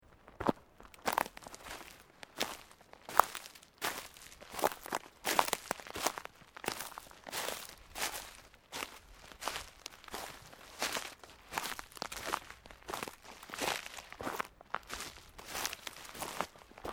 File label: Footsteps Boots Underbrush Sequence